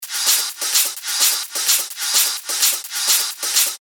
128 BPM; Revealed Top Loops Vol. 4

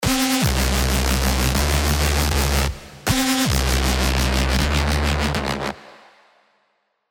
Bass; In the Beginning, Bass; Revealed Serum 2 Reese Genesis

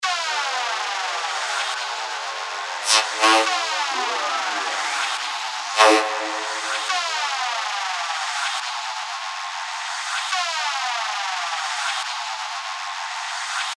FX Loop (140 BPM); Sparsity; revealed fx loops vol 2

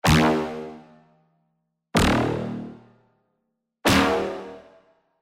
SY Brass, serum 2 bass tech house; revealed serum 2 bass tech house vol 1

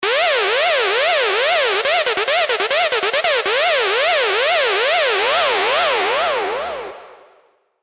FX Alarm; Alarm Nah Stop; revealed serum 2 hard bass meltdown

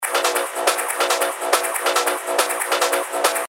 140 BPM, techno loops; Hex; Revealed Techno Top Loops Vol. 9

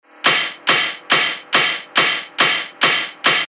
140 BPM, techno loops; Kraftzman; revealed techno top loops vol 8

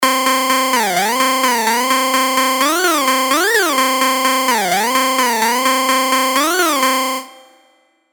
Motor Error; revealed serum 2 dirty dutch vol 1

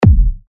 TECHKICKS F#, techno kicks; Hogwarts; Revealed Techno Kicks Vol. 10

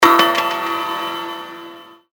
percussion E; Mr Rime; revealed percussion vol 2

